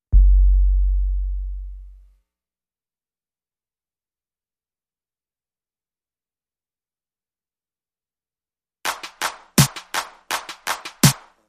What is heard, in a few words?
Drums and Perc Only